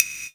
LO CLAVE GT

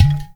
UDU; Spectrasonics; Heart of Africa

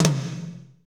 TOM, Drumscapes Roland; Northstar - Drumscapes Roland

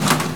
Door of a Train; Love Quest

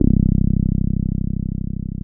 TB-303

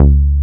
bass Jupiter 8; Best Service Gigapack 2

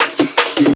Break, Drum N Bass Percussion

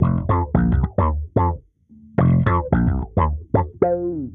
110 F, Bass, dusty funk

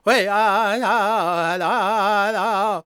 C# MAJ, World Voices, LAKOTA; Spectrasonics Vocal Planet CD5

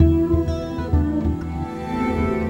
Bees, Acoustic Loop Chant; Sonic Foundry (Sony Creative Software) - Friendly Fire; DroneLoop

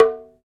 Bongo Hit; Sonic Foundry (Sony Creative Software); Joe Vitale Latin Percussion

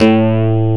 DIGITAL BASS, BassGuitar

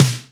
SD BONUS, Drums

